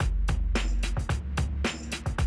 110, Classic Drum Loops

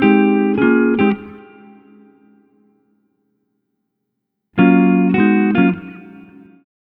Multi Guitar Swing Gtr-G#, 105; Zero-G Kocktail Kollection; Construction Kitz (Lounge)

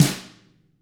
tama hit; ILIO; Double Platinum Drums 1

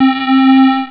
Syn Lead; Amiga Soundtracker Sample Packs

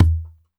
DEEP WD, DRM HITS; Spectrasonics; Liquid Grooves; WAVDRM HITS1

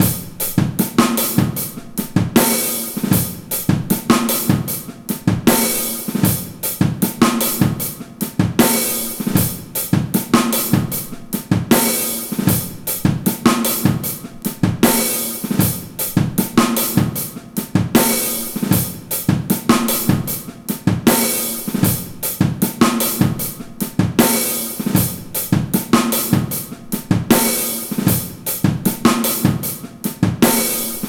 39 PUSH 'N' PULL 77 bpm, Loops Breaks; POTBreaks

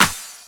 SD HOU, Dance Mega Drums; Best Service

